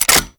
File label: Reload, Weapons; Warframe; Boar